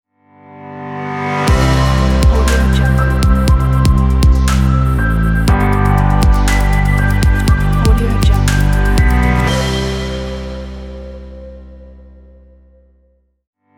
light and inspiring logo

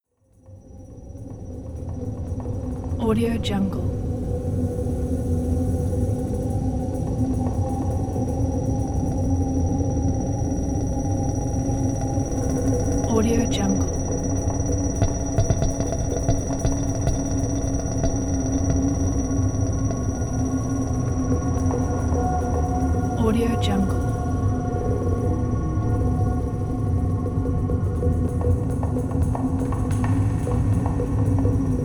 horror background ambience sound pack

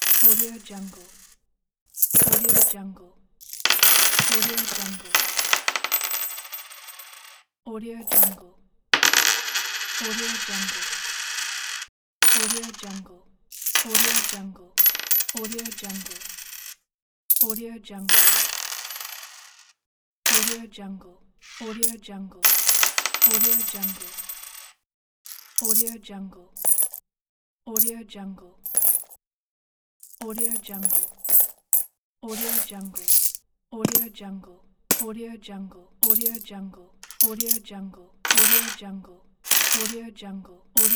fall coins pack

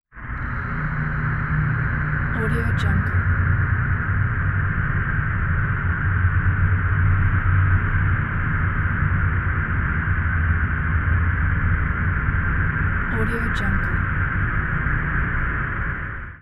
space noise

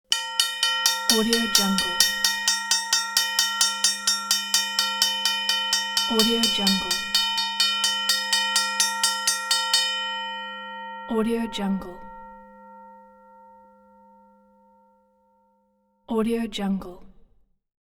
bell railroad crossing

